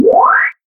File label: Powerup